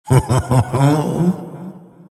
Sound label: crown laugh; dota; monkey king